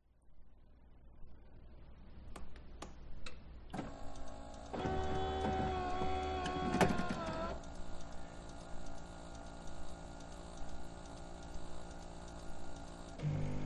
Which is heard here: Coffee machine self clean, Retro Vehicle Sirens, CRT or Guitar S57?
Coffee machine self clean